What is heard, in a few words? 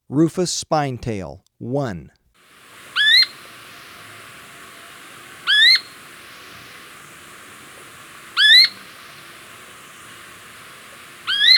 Rufous Spinetail; S. America